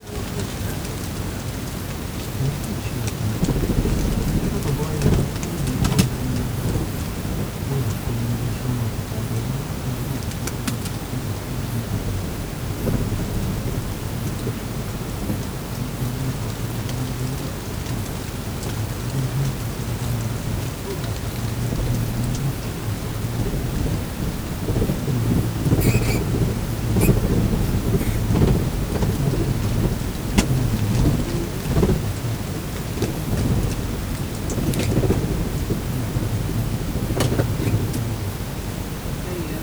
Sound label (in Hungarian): sokan emberi beszéd is, denevérek hallható hangtartományban kommunikálnak; termeszetben